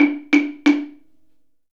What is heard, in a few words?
Slit Drum; Sonic Foundry (Sony Creative Software); Essential Percussion; Drums